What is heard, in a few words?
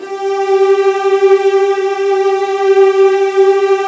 Strings G2 L; Virtuoso